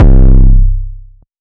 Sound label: regret phonk 808 c; phonk toolkit; rocket powered sound